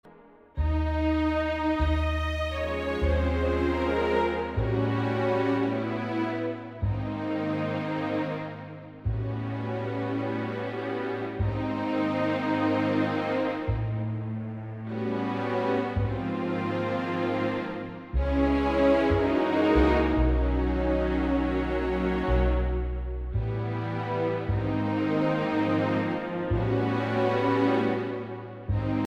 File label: orchestral